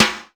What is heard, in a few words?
RIM, DRUM KITS, Hip Hop; InVision Interactive; 40 Oz Phat Slammin Hip Hop